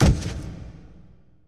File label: boss hit; Pirates; mermaids song